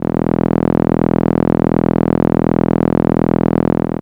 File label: Contra E1, ARP Solina, contra bass; musicradar-synth-samples